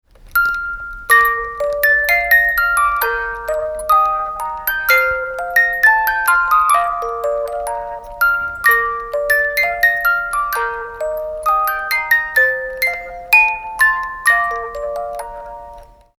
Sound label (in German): internationale Spieluhr